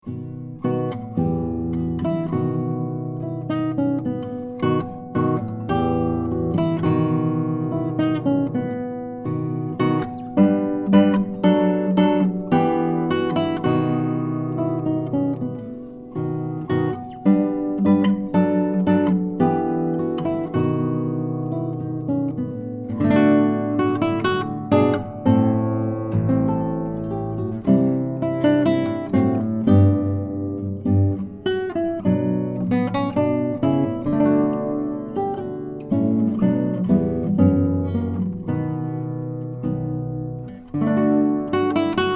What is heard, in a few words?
Danza; Neumann KM84